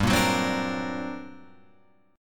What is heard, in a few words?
G Minor Major 11th, Guitar, Open F; GmM11 strummed; JGuitar